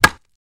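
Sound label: Staff Wood Hit Slight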